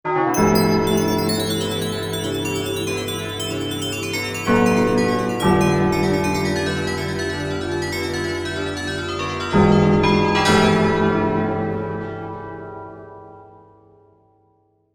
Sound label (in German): Glockenzucker